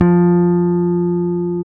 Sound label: Basses, Roland